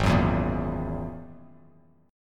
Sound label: Ab 7th Suspended 2nd Sharp 5th down Bass Standard; Ab7sus2#5; strummed; chordshape; JGuitar